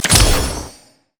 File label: pick a card blue card; LoL; twistedfate